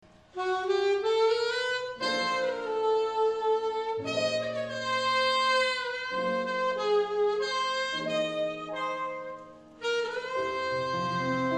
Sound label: frog tune